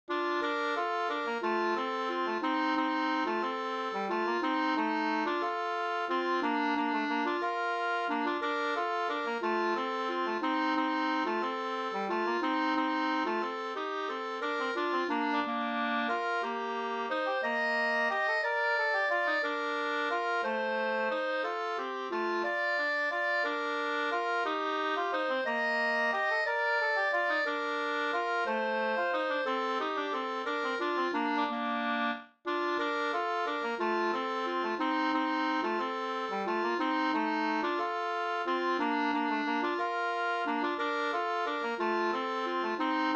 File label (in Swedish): folkdans Bbdur; Hammerschmiedgesellen 2st